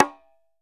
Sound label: quinto sol; E-MU Producer Series Vol. 7 – Old World Instruments